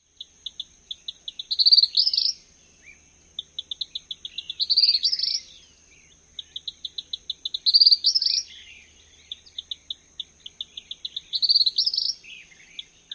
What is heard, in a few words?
dawn song